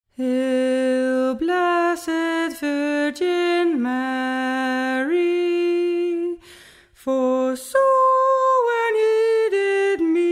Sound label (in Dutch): BAS